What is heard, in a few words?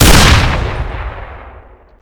doomstick fire